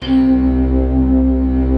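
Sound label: harpsichord, slow harpsiflute; Optical Media International; Sonic Images Library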